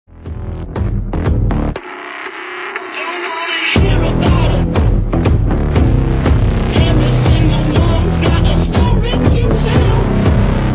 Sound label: dubstep remix; gi joe retaliation soundtrack